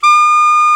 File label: SAX A. MP, SAX Alto Short